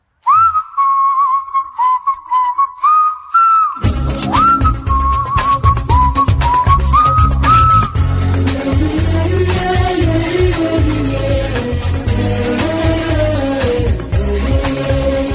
whistle mix ringtone

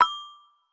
ringtone, samsung sms ringtones; highlands

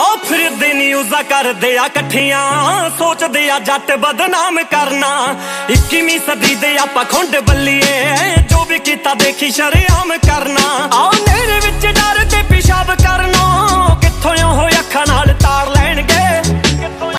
new punjabi ringtone

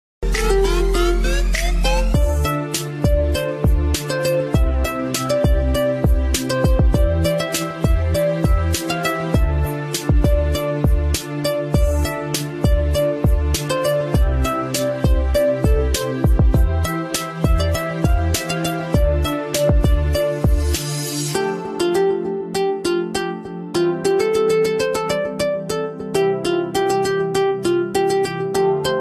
love bgm ringtone tamil; Kavan; bollywood

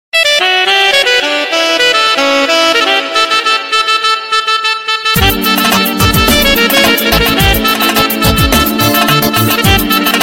nokia arabian ringtone

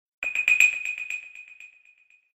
msg ringtone, samsung sms ringtones

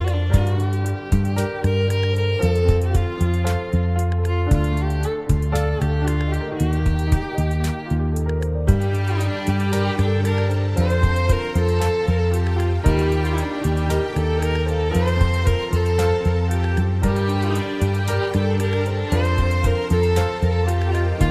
violin hindi ringtone

